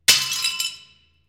Breaking Glass

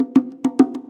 Bongo

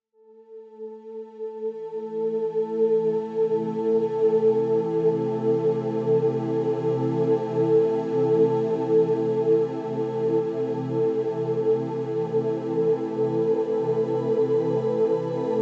Strings

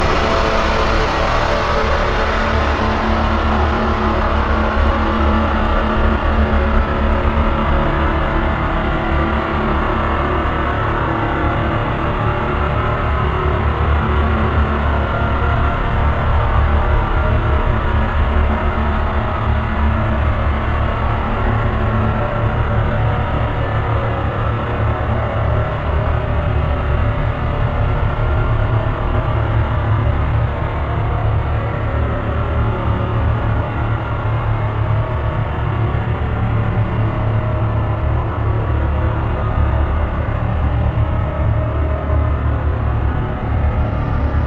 Black Metal Drone

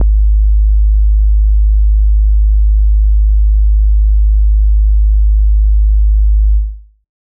Sub

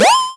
Pickup

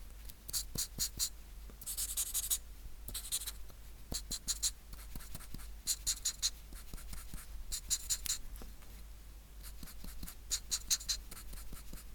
Writing With Bold Marker

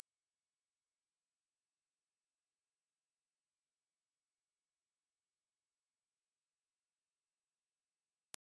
Ringing Ears

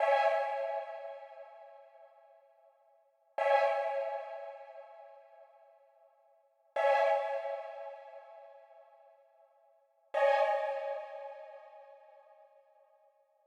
Dark Choir Chord Stabs 142bpm C; Trnd Setter Pack

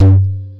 Djembe cheap mic session

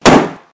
Door Slam Gun Shot

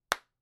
Clap

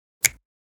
Finger Snap Stereo

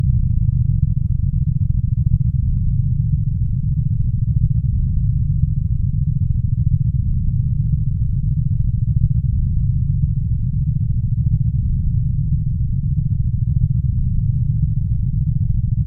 Background Drone Spaceship; Karma Ron Fx

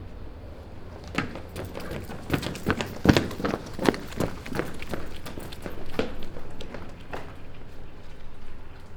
Men Running On Pavement Passing By

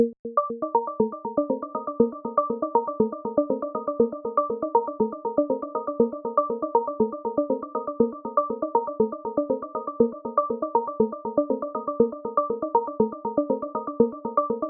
Sweet Melody

